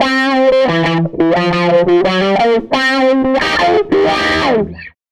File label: Tele Guitar Loop